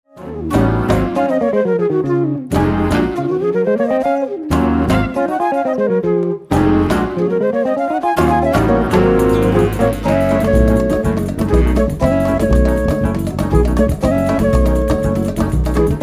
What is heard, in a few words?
batucada jazz